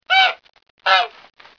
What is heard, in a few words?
goose sound, 鹅